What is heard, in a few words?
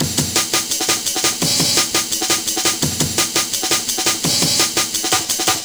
Kickin Break, Drum & Bass, Beats & Breaks